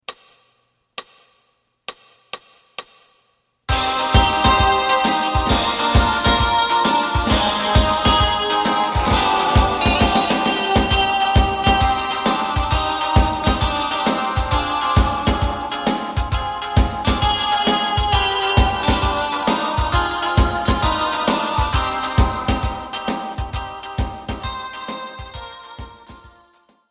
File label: bass